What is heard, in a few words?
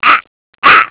"ah ah"; beavis and butt head